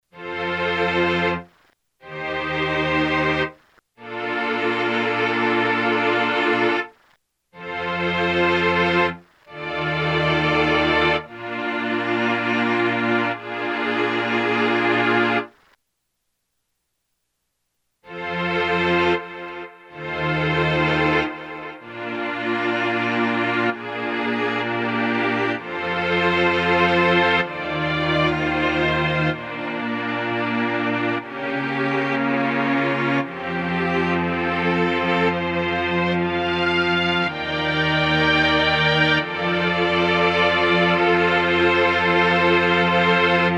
Tape Delay; t.c. electronics M300

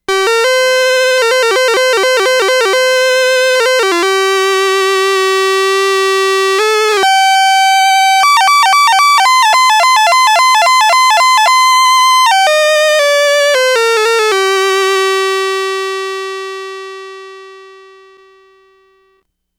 Fuzz Guitar, Roland SH-2000

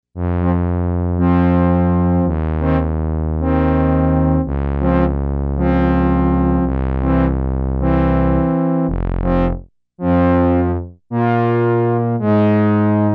French Horns

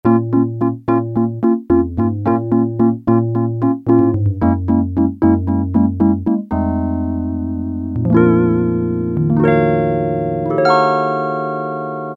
E.PIANO

